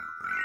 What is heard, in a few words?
LOOP Mallet Mono